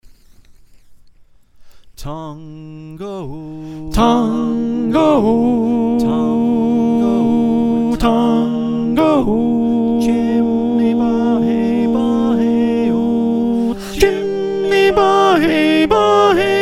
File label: Alto, choir